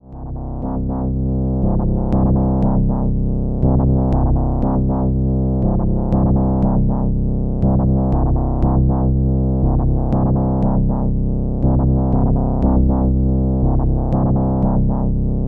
Rossum Electro Music Morpheus All Pole Dist

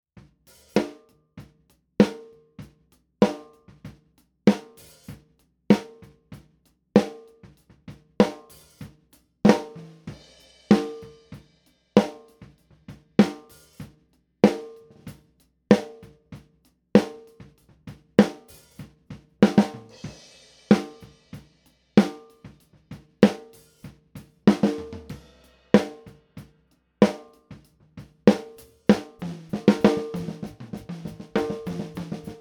Snare Bleed; sE Electronics V Pack

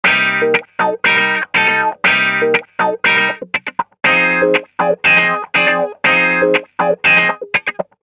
Wah Complex Funky

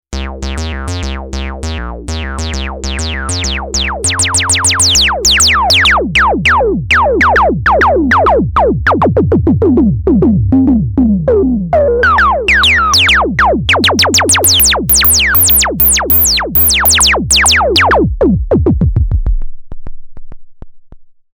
Reso max

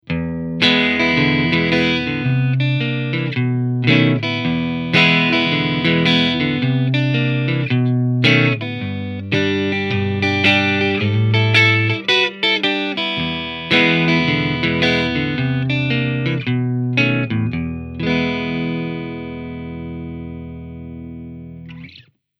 Engl Clean Low Gain

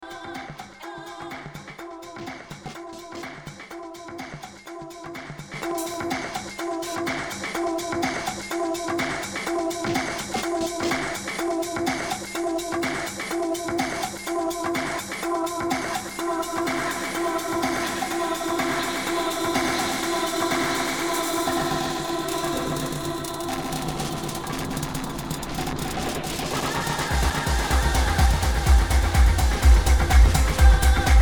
DJM-900NXS2 Pingpong

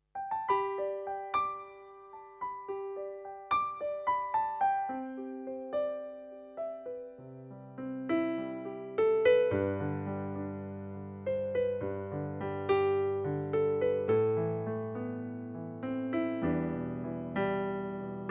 Modern Piano

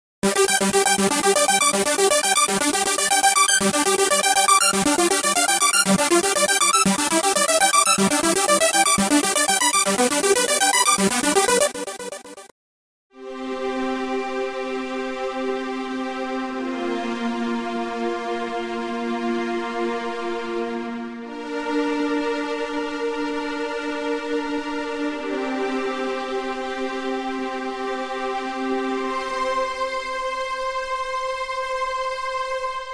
Synth Complete

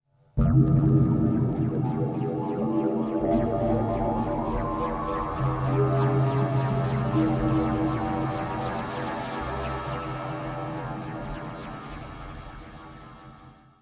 iridium ice pipe

